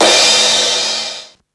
Crash, Ensoniq Mirage

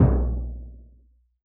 surdo open; AnlgKit1

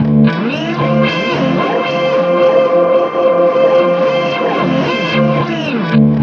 heal tones, guitars; Big Fish Audio - Alien Guitars; LOST HEALING

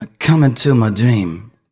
m 128 mel b-flat, Vocals

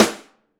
AMBSN, ST AMB SNARE

